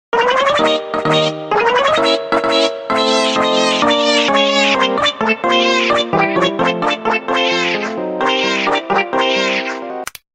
Cat Meows; Pink Panther

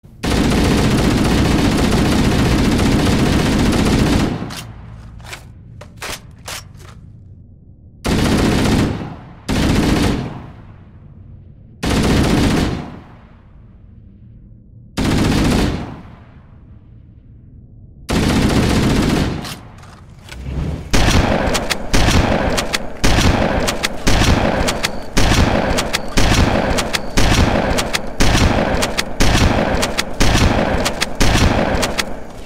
Weapon Sounds

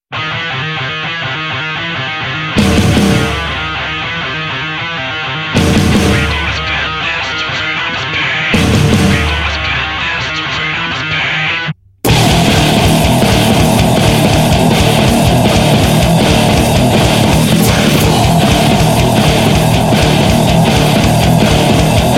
rock

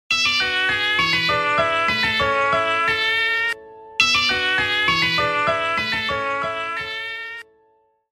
Cat MEOW nokia

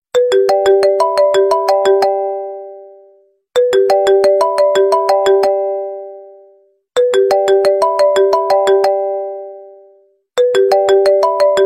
iPhone 11 New

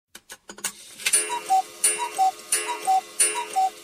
alarm bird clock

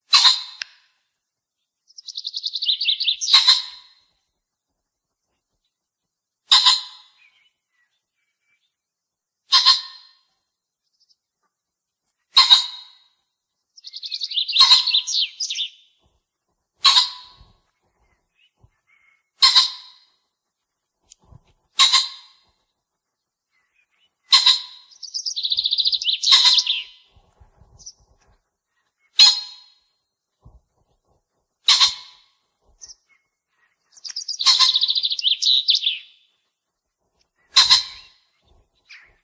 Sound pheasant

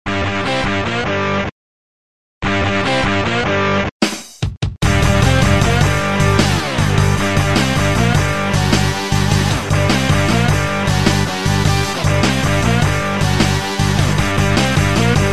rock nroll racing

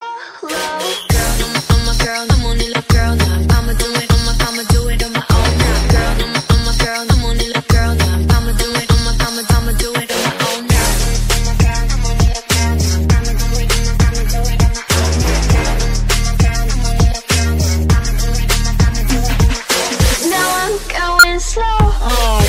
Solo Remix

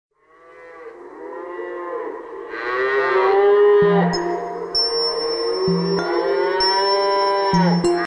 Cow Farm

